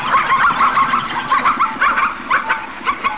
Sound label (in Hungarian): zebra